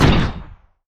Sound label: shoot nuke